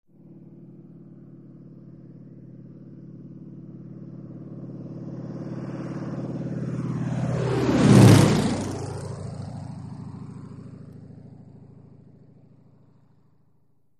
High-speed Flyby At Medium Altitude. Medium To Distant Perspective. Prop Plane.; broussard